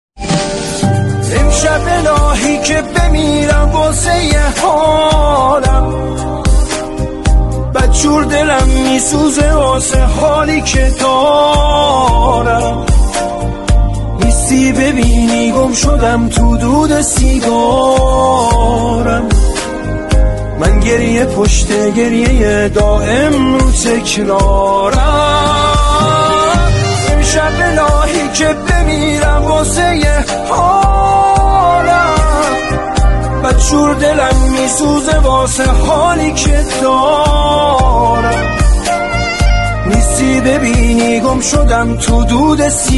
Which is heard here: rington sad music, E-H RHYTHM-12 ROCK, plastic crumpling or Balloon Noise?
rington sad music